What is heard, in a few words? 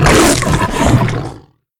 Monster Eat Human; Monster Ralph Eat Human